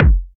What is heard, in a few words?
clean steel kick drum sound f sharp key